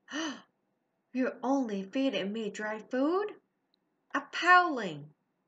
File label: gasp